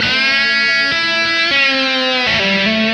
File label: lead, 100